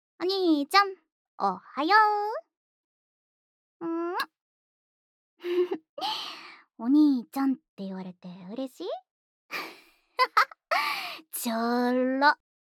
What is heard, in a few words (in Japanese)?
おはようのチュー; おはようのチュー お兄ちゃんver